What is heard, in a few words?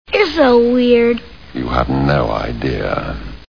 lion wired